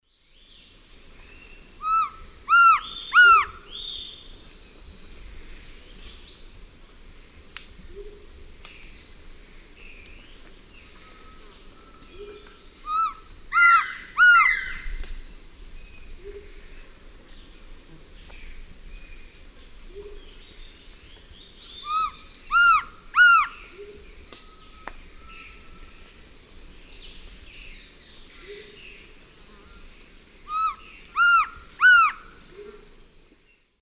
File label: Trogon rufus, song; S. America; Eastern Lowlands